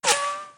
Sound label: bow hit